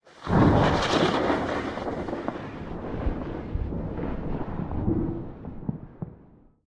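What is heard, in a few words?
zvuki molnii, lightning sounds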